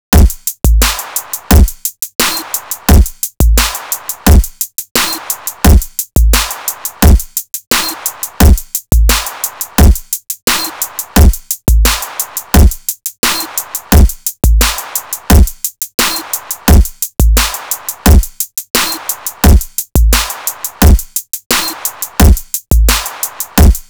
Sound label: drums